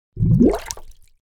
Air Bubble Underwater Bubble Surface - Short; Hollywood Edge; sound designer's toolkit v2

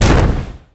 Boxing Ring Bodyfall

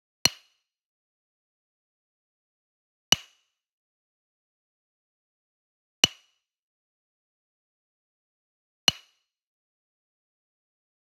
Wood Percussion Wood Block Traditional Hit Near; boom library; Cinematic Strikes Construction Kit

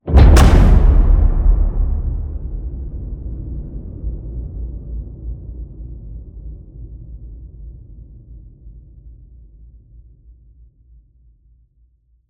HIT Low, Cinematic Hits Designed

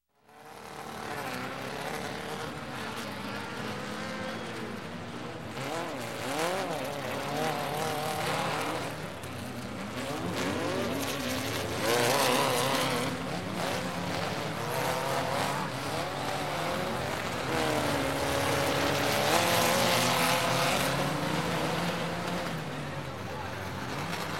Dune Buggies, Race Racing Around Track; Crowd; Sound Ideas; Series 2000

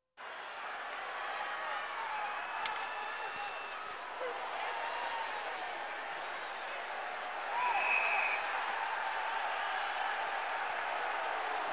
Sports crowds; Valentino Production Sound Effects Library CD50

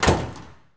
68 Road Runner Hood Close, Automobiles

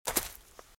Footsteps Leaves Walk Left; RadwinIsland

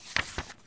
Book Page Turn; SFX Kit; Foley - Misc

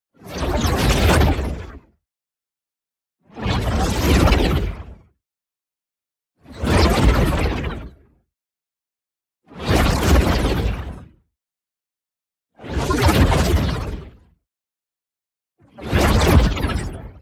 WHOOSH LARGE Space By; boom library; Matter

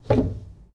Foosball Blocker Rotate

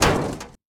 shoulder hit rail; Blind Drive

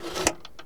Plane P40 Lever Throttle Mix; Airplanes

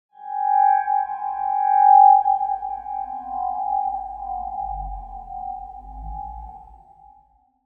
Tone Mysterious Circular Ringing - Beginning; Hollywood Edge; sound designer's toolkit v2

